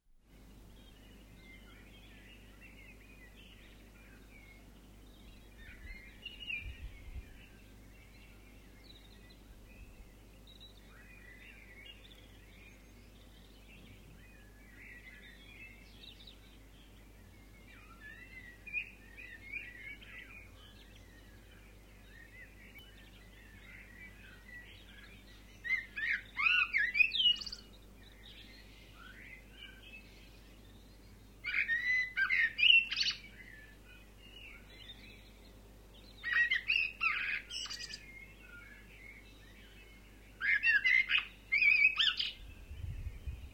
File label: Birds chirping distant and up-close